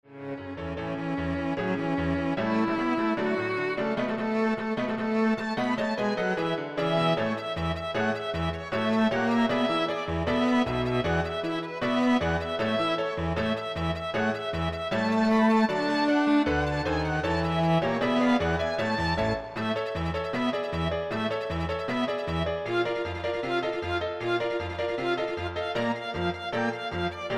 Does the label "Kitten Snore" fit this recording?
No